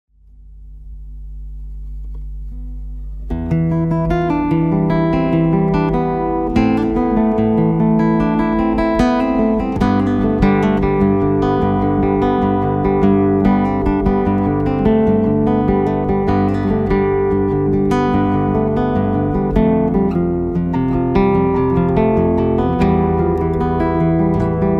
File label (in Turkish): Gitar